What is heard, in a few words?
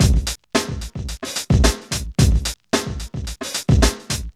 THUMPIN; Zero-G; Funky Elements